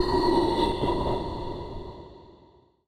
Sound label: ghost breath